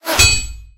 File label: tank hit; War Thunder; sfx tanks